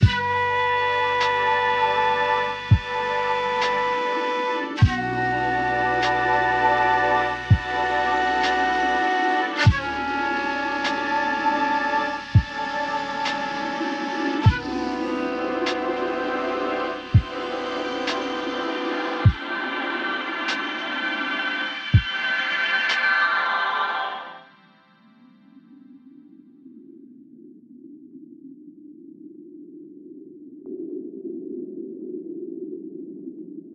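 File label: Japanese sci fi intro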